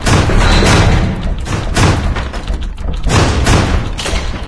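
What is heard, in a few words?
zombie door loop; video games; city; source engine; episodic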